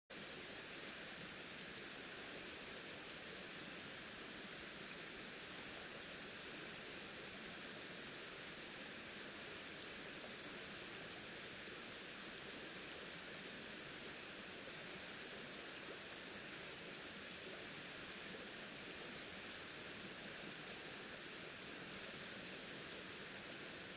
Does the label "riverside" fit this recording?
Yes